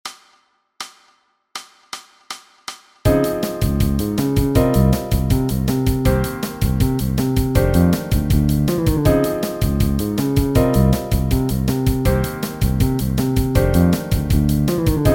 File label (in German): Playalong für Solo